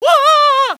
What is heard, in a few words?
whoaaaa; DS; ML BOWSERSINSIDE; Mario